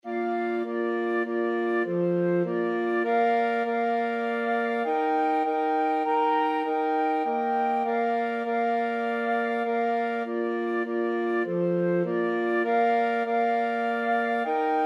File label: flexible mixed ensemble, 4 players